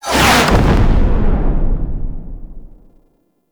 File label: Defense Next Wave; Warframe; Nineteeen Ninety Nine